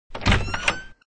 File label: Door Open; bobtherobber2